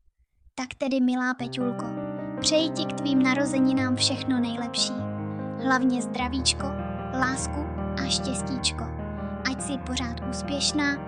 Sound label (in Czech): klavir